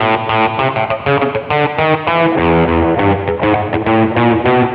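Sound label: distorted guitar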